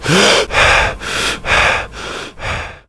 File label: breath out; Modern Warfare; weapons; foley